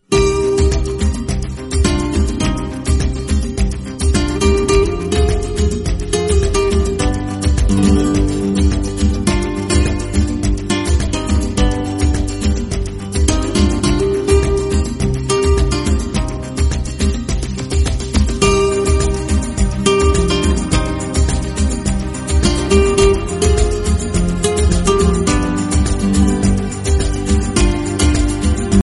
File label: soft love music latin tone